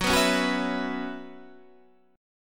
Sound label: F sharp 7th Suspended 4th; strummed; F#7sus4; 14,14,11,11,12,12